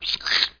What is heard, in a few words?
lick